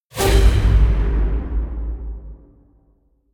String; Assassin's Creed Unity Dead Kings

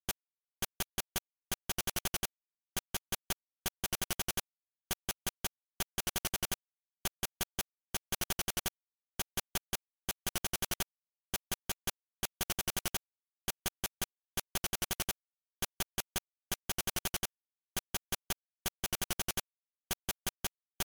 BGM rhythm; game builder garage 2021 switch; Node 05

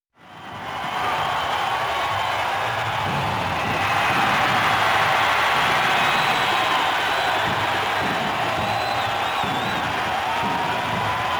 Stadium Stage (AMBIENT); King of Fighters XIII; PS3 version; Rose Bernstein Stadium Stage (AMBIENT)

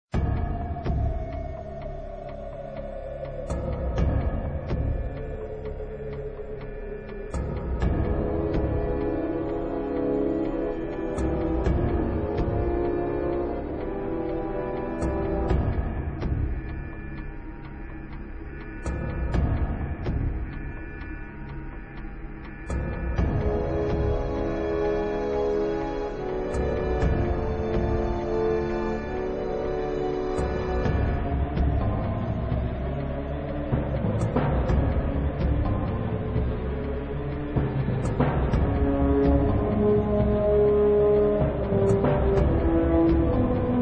Sulphurine Mines (Ambient); Disruptor

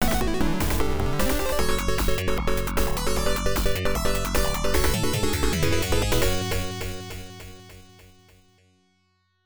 Chime Theme; Metal Force; PC-98

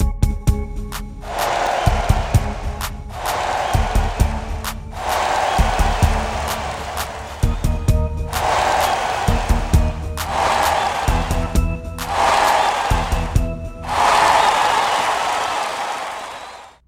Ditty

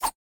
Throw; boomerang fu

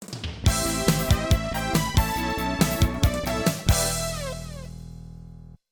Before Combat BGM (SC-55 Version); Asuka 120% Burning Fest.; x68000

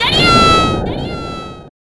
Microphone Scream; Kirby Super Star

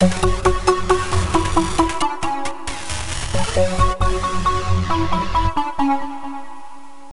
Alien Defeat; Lego Battles 2009 NDS

